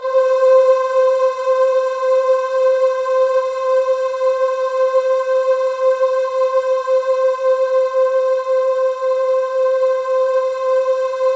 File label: C4, Synth Leads